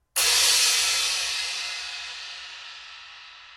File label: FX 18in Spiral Trash, cymbal sound